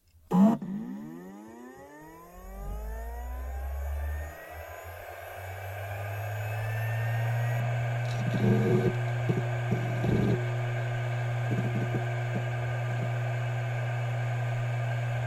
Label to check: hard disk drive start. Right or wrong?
Right